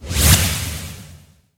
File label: whoosh